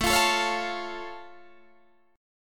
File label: A Augmented A down Guitar Open D